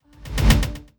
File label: Jolt Standard